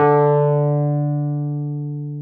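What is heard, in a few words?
WURL EP D3, Wurlitzer electric piano; Sonic Reality; Vintage Timetraveler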